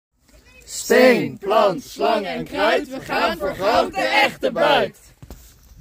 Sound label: Yell; Groen Terra